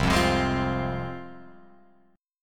C Suspended 4th Sharp 5th C Guitar Open F, Csus4#5 chord; Csus4#5 strummed; 0,0,0,3,3,0; JGuitar